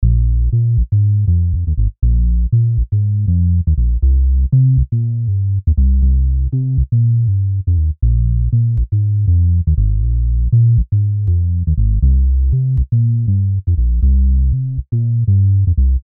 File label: 120 Bb Synth Bass